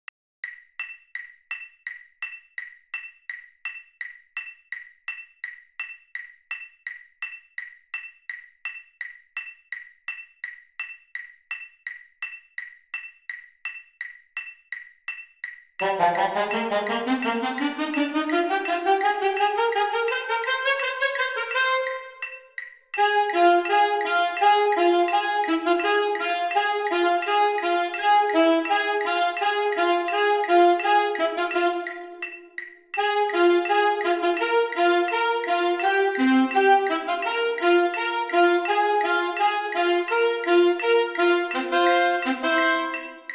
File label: alto saxes, marching band